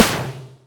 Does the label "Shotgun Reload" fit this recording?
No